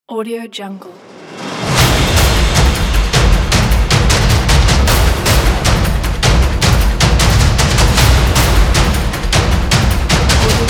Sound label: action drums